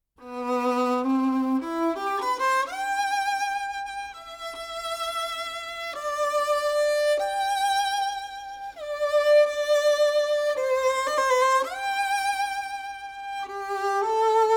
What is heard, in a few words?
tragic romance